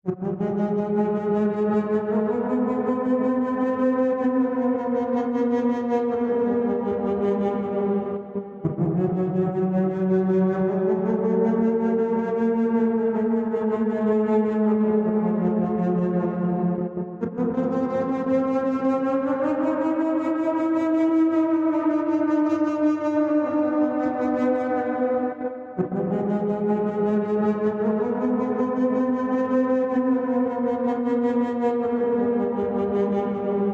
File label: unholy choir; added musics for rooms; space game